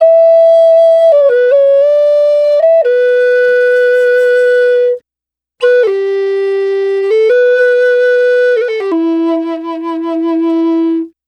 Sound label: Native Flute, Native American; Sonic Foundry (Sony Creative Software) - Continental Drift Aftershocks